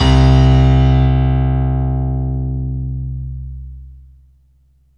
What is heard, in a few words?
PIANO C1; Best Service Alpha Dance vol.2